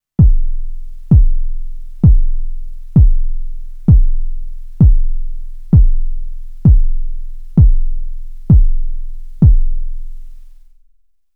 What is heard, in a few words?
909 noise decay